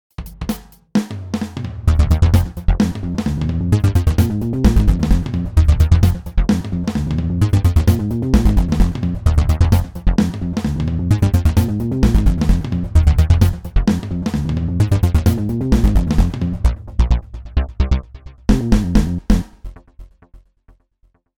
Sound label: Jingle